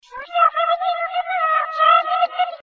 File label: Echoes